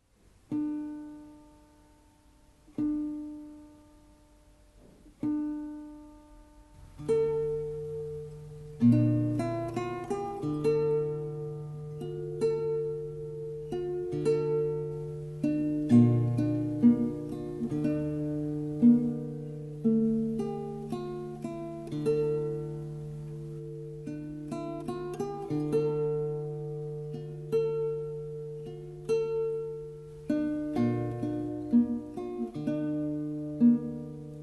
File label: swan lake guitar